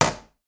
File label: bathroom toilet seat lift; a dump in the dark